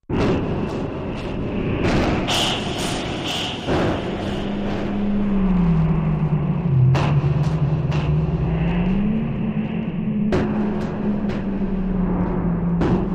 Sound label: Alien Toy Maker Machin, air